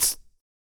PIERCD LIPS, vocal; ILIO; Vocal Planet VOL-2; Blues & Soul

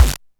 Crushed Perc, bitcrushed; musicradar

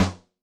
Yamaha DTXpress II Snare

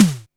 SNARE; Back In Time Records; Big Bang; SNARES

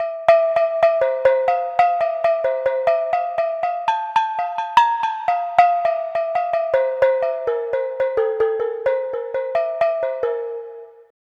Pelog Gong Melody; Melodic; Ambient-Melodics-II

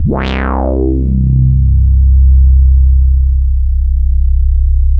2600 bassics, vintage keyboards; Big Fish Audio; First Call Vintage Keyboards

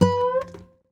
Sarangi, Acoustic String

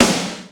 FM SD, MOD. DRUMS; East West; Global Sounds

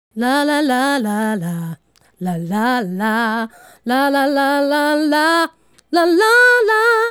Female Solo, Hip-Hop and RnB Vocals

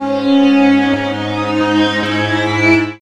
C# min, Strings, Drum & Bass; Bengal 160; Total Drum&Bass

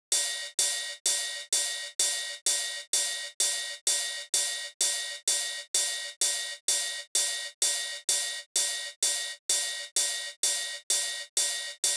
128BPM Ride; Vengeance; Essential Clubsounds Vol.5; Mainstage Kit; Root Fmin; Drums & Perc

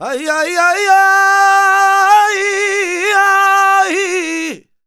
voc c, Flamenco Vocals Male Cantaor; Best Service ProSamples vol.46

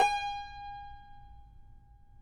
Cumbus G#5; Spectrasonics; Hans Zimmer Guitars VOL-2

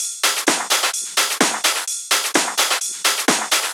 Loops 128BPM, Drumloops

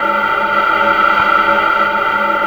inhale; Spectrasonic Distorted Reality 1

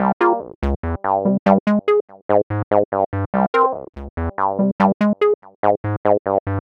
Crunch Syn, Keyboards

